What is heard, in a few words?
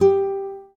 Classic Guitar, notifications